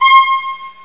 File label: synthesizer ping; Sound Effects for Multimedia - Volume 1; electronic sounds